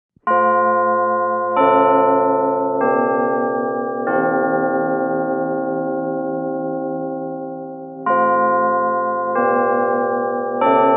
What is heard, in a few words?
Lg Westminster Clock, bell towers